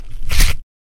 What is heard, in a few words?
decapitated